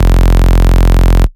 Bass Cuts; Vengeance Samples; VES1 Instruments

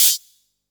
Special Hihats; Vengeance Samples; VEC1 Cymbals

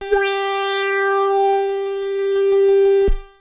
F G4, synth; Roland S100; musicradar-synth-samples; Roland F Multi

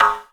percussion; Vengeance Samples

